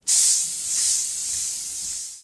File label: Bug Spray